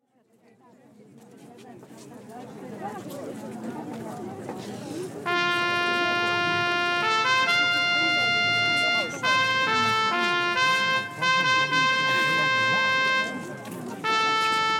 hejnal mariacki; Nagrania Lokomotywy

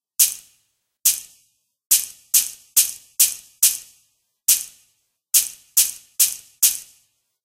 marakasy; Nagrania Lokomotywy; edukacja wczesnoszkolna

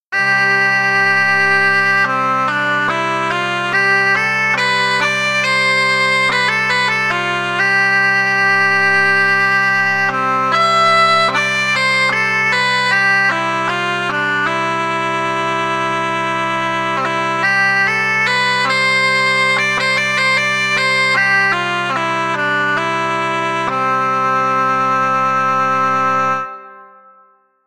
dudy; Nagrania Lokomotywy; edukacja wczesnoszkolna

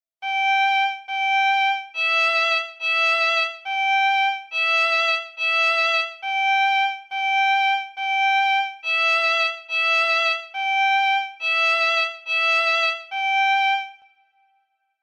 skrzypce rytm; Nagrania Lokomotywy

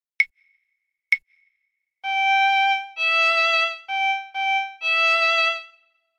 dyktando skrzypce; Nagrania Lokomotywy

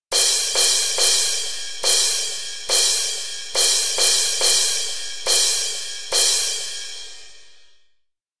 talerze rytm; Nagrania Lokomotywy

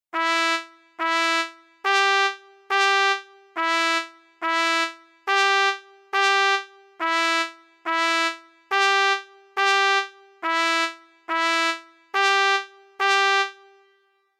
trabka rytm; Nagrania Lokomotywy

